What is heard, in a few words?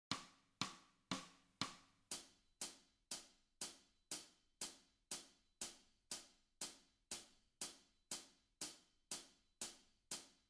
Backing Track